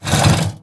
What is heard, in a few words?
Stalker Damaged Crawlscrape Concrete; Titanfall 2